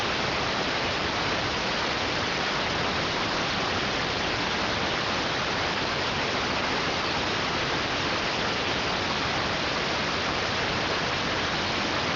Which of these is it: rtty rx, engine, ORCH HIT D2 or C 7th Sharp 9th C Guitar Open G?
rtty rx